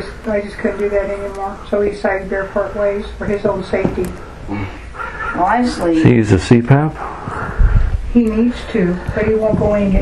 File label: laugh; NOhioStSpfld